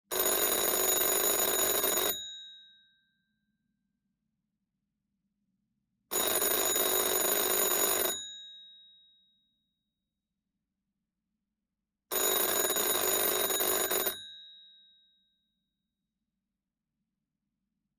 Antique Wooden Phone (1930) - USA Standard Ringing; Sound Ex Machina